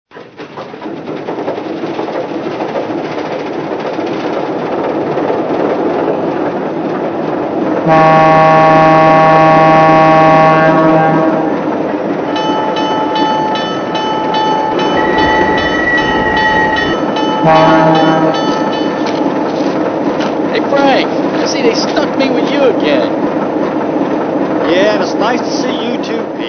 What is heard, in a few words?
VO-1000